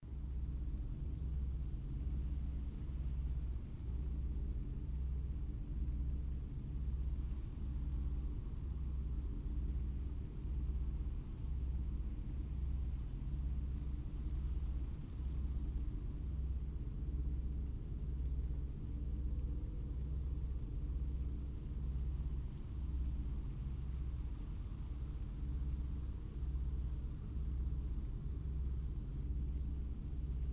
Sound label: AMB Misty Lands Houses